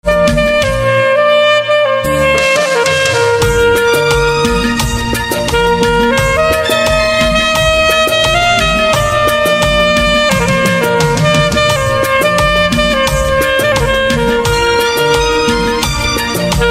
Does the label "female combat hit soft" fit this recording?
No